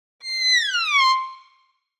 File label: Cartoon violin gliss down